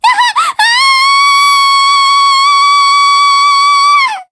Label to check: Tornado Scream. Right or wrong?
Right